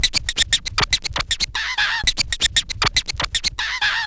SCRATCH SEQUENCES; SCRACTH; Megabass Remix! 2

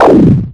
Dunk, Drums, Industrial; Sonic Foundry (Sony Creative Software)

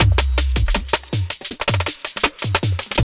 JUNGL 160, JUNGLE LOOP

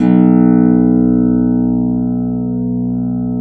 B1 HARP; Complete Harps; USB Soundscan vol.62; AKAI

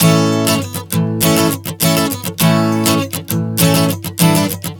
Strum 100 A, One Chord Strumming 100 bpm

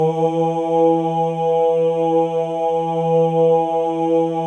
male ohs; Spectrasonics; Symphony of Voices

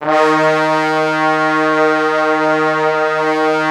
D#2, Orchestral Brass; Best Service ProSamples vol.20 - Orchestral Brass; AKAI

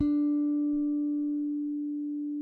SPEC SUSTAIN, Bass; Sampleheads; Will Lee Bass Library

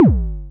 Short, Kick, Traditional; Multi-Kick; Long

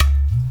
UDU; Spectrasonics; Heart of Africa

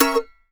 MANDO 7TH F#7; Sampleheads; Whole Lotta Country 1 & 2

